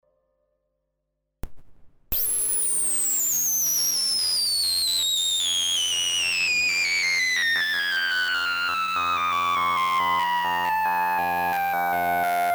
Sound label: Loopstart